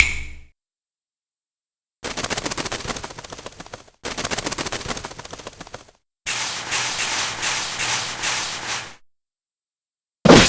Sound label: transform